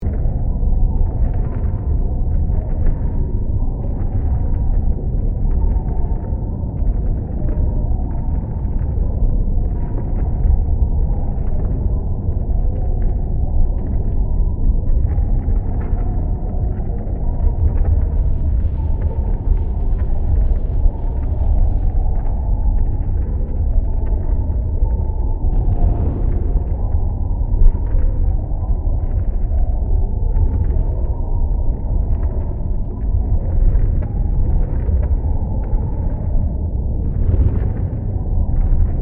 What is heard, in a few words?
Volcanic cave ambience underground; nature